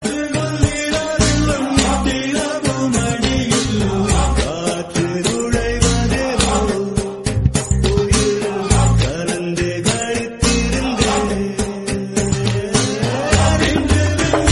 Tamil South Indian

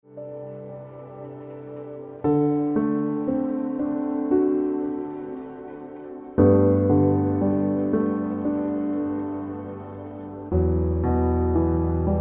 Piano Music

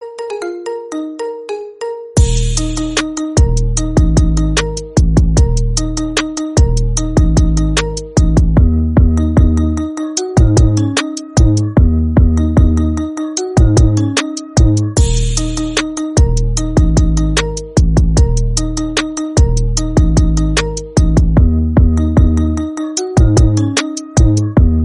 iPhone Marimba Remix Instrumental

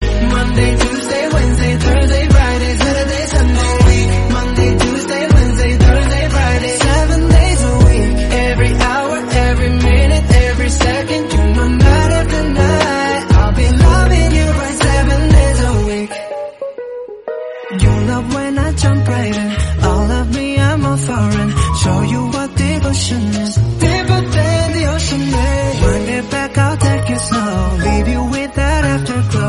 Lofi English Song; ringtone

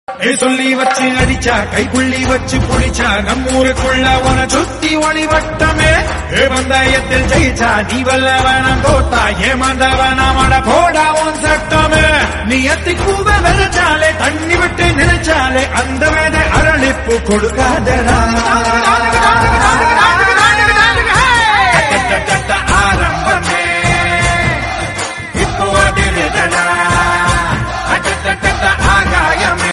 tamil song Ringtone

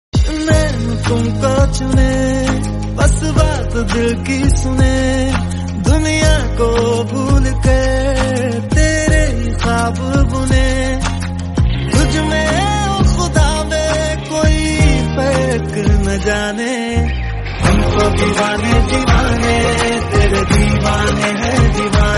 Hindi Song

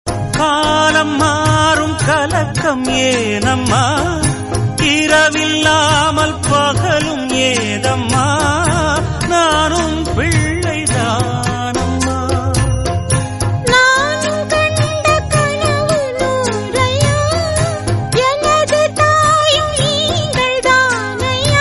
Tamil; Ejaman; Yejamaan

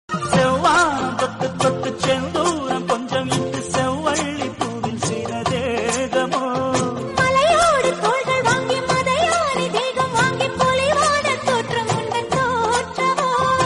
Tamil Song

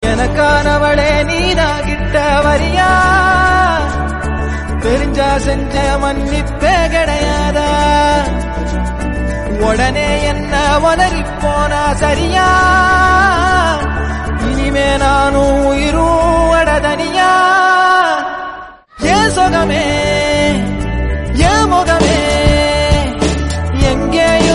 Tamil Song; Manithan

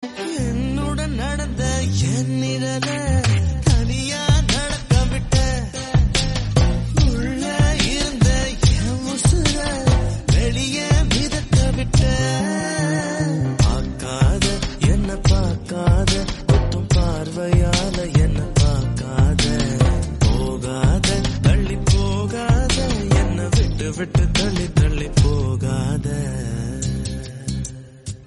Tamil Movie Song Ringtone; Aaru

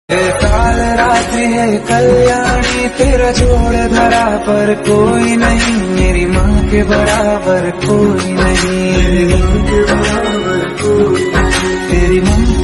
Hindi

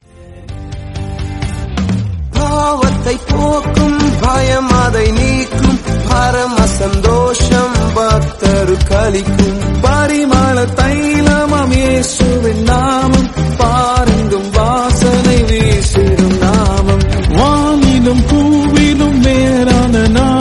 Tamil Christian songs; ringtone

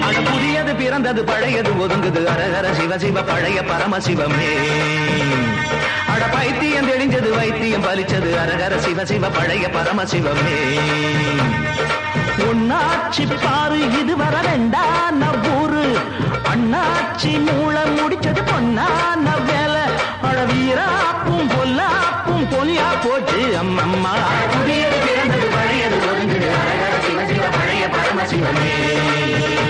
Tamil Song Ringtone

